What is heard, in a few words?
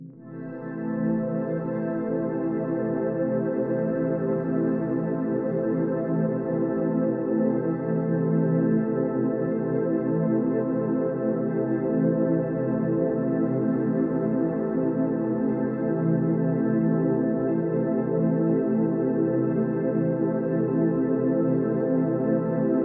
Pad A Minor 20 Bpm